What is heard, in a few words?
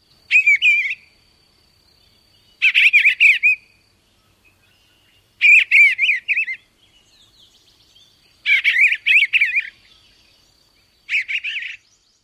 song, bluebird